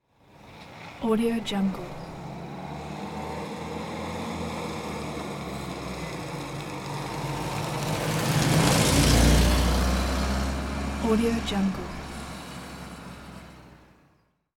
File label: 1970s french sedan drives by; hollywoodedge